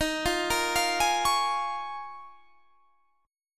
D sharp 7th Suspended 2nd Suspended 4th D sharp; D#7sus2sus4; arpeggiated

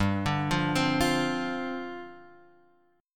D-Minor 7th, G

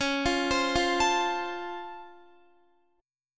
C sharp Augmented Major 7th, Guitar; arpeggiated; 4F,4A,5C,5G,5C,6E; C#+M7; JGuitar

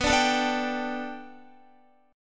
B Minor 7th Sharp 5th B Guitar, chord; Bm7#5; strummed; JGuitar

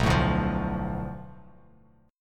B Minor 7th Flat 5th B Bass Standard, chord; Bm7b5; strummed; 7,12,0,10; JGuitar

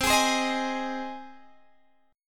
C Minor Sharp 5th C down Guitar Half Step Down

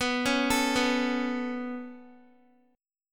B 7th Suspended 2nd, Guitar; B7sus2; arpeggiated

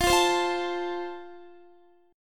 F Major, Guitar; F strummed; x,20,17,17,17,17

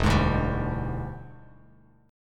E 7th Sharp 9th E down Bass Standard; E7#9 strummed; JGuitar

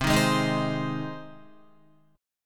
C Minor Sharp 5th C down Guitar; Cm#5; strummed; JGuitar

